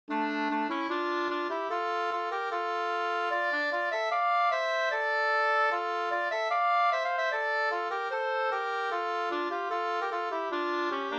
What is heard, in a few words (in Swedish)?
schottis; Lanna-Villes schottis Nb 2st